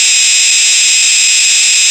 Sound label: static, radio static; Zero-G Ambient 1